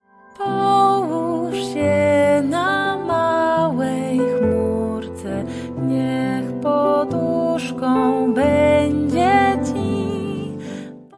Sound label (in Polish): kolysanka